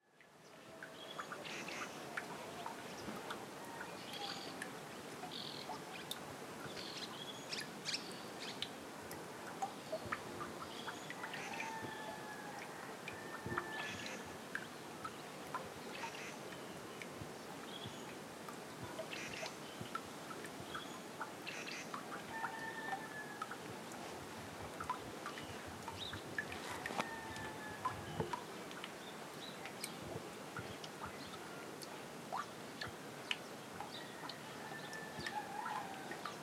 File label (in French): nefta puit; resonances oasiennes